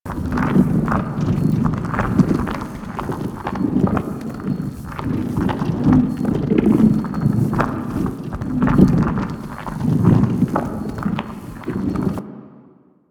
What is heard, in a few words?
Plasma Prop Loop; Ambience; Props; Warframe; Entrati